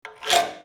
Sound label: scrape, Cartoon Film Effects